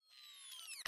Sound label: Mounted-Camera Timer Start